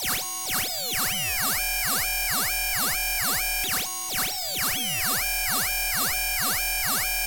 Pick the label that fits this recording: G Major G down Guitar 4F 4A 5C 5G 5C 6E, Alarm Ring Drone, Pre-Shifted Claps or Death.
Alarm Ring Drone